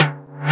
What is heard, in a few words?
M TIMB, DRUMS; Analogon